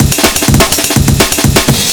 layered break 250

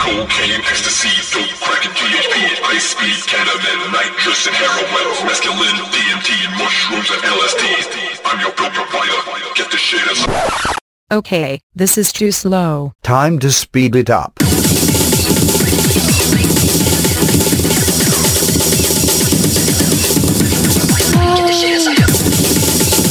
Speedcore

